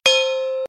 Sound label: game buy